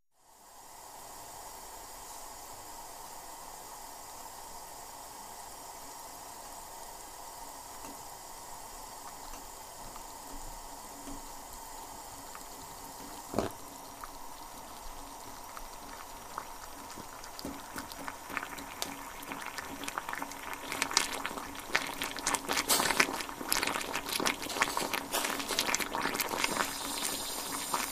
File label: Boiling Liquid